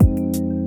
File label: Rhodes Chord, Pads